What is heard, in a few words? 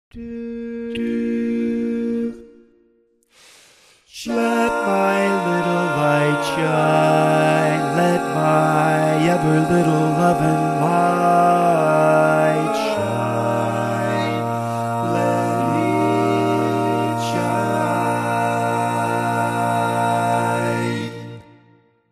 Bass